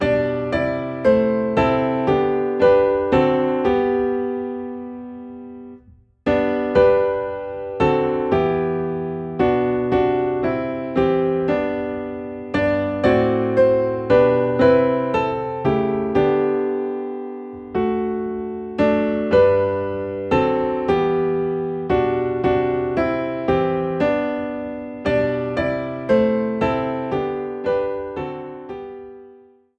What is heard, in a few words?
Piano in G; When All The Mercies